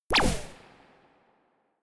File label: space laser gun bubble shot forceful; krieg-waffen; soundpool